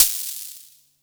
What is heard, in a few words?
Noise Crash Block; FREE SFX PACK VOL 3